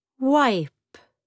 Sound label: wipe